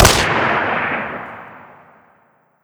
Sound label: medium far distant, weapons; Modern Warfare